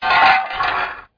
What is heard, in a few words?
Metal Rustle